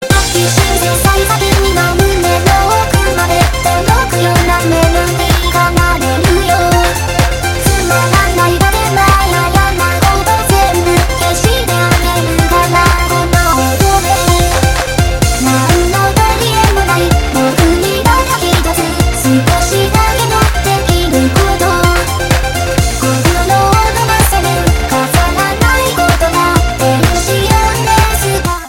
Happy Synthesizer; meloboom

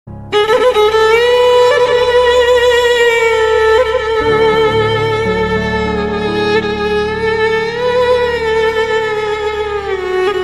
Arabic

